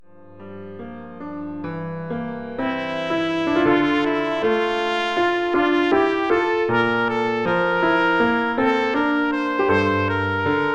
trumpet in Bb solo

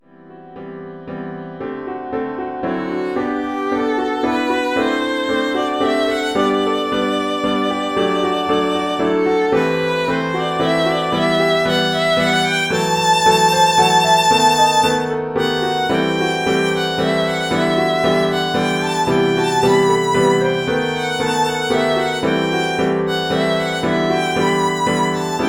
WALTZ violin solo

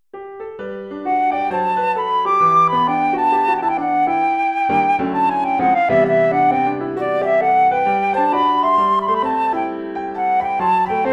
flute solo + PA

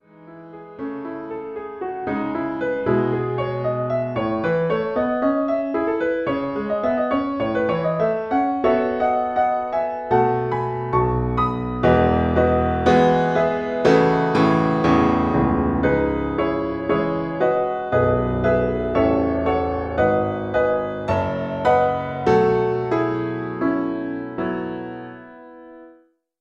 advanced piano solo

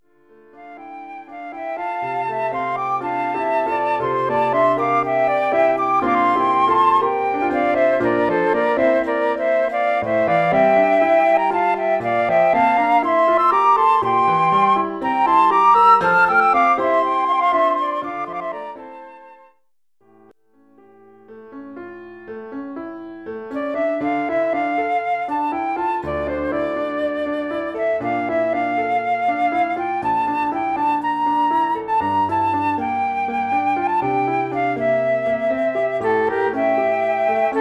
flute duet

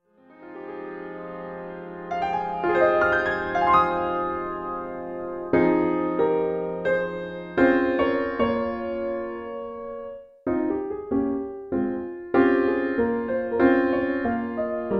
advanced piano solo

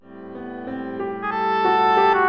oboe solo PA

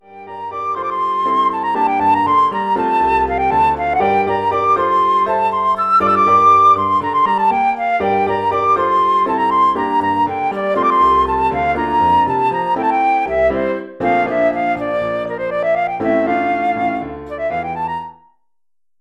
flute solo + PA

